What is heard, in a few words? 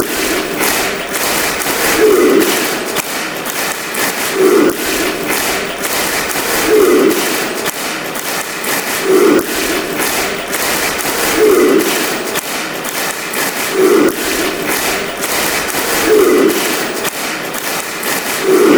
clapping chant